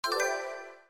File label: ui reward small